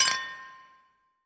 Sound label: Beer Glass Clink